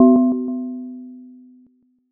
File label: gong